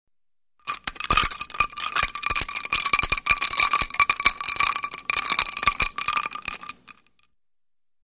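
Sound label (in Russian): zvuk vzbaltivaniya zhidkosti v stakane s kubikami l'da, liquid swirled in a glass with ice cubes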